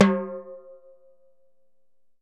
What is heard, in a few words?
TIMBALE, Salsa Kit; E-MU Producer Series Vol. 7 – Old World Instruments